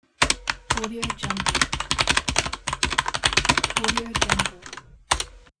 clacky mechanical keyboard; yoursoundmysound

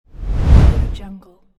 swoosh transition

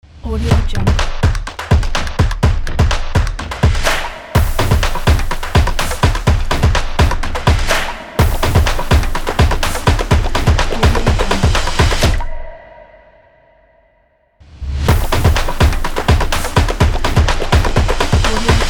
drum glitch logo

